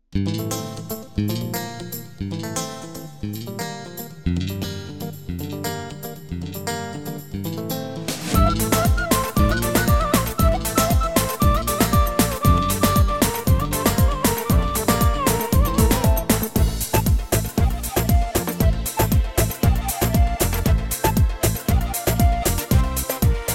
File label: Dum Tek Tek